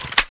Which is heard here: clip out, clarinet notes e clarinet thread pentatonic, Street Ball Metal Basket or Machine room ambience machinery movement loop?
clip out